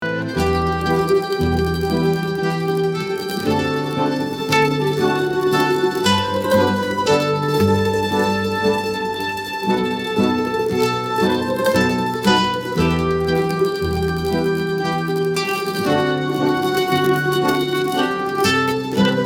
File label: italian classic; europe